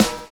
N.Y FILL; Univers Sons

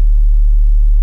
BUCHLA, Rare Synthesizer; Hollywood Edge; Giorgio Moroder Rare Synthesizer Collection

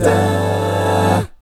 GUY CHORDS AA, Vocal Planet Jazz; ILIO; Vocal Planet VOL-3; Jazz & FX

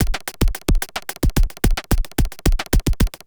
Industrial Beats

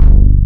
FUNKY LD, DANCE; Pro-Rec - Synthsonic; Z1 DANCE

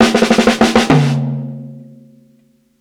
60'S STYLE 100 MTOWN FILL, DRUMS LOOPS; BASEMENT DRUMS VOL 2

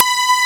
Distant Valley loop, SNES; Cu-On-Pa

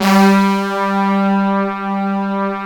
G2, TRUMPETS, Synth

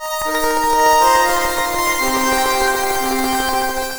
Synth Textures

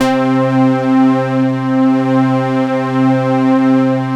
C3 prophet pad; Sound Gallery - Charged

